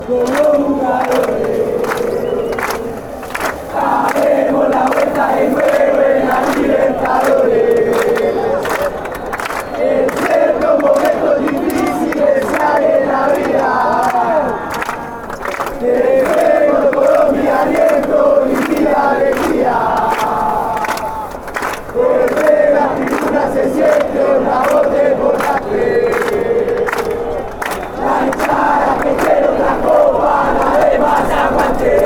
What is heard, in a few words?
atletico nacional chant